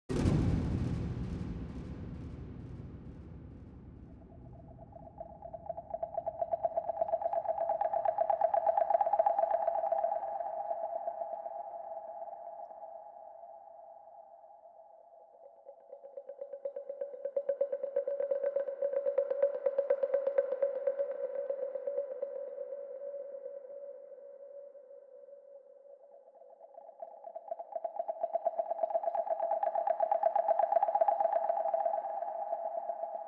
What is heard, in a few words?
Caves Ambience; sundered eldritch edition; windows